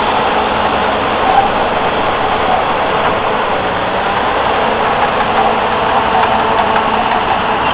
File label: BULLDOZE